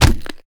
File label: fall small, damage; HunterZ G4; minecraft